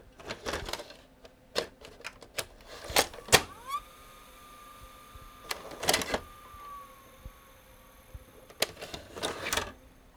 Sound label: VCR Tape Reject; SFX; IRL Recorded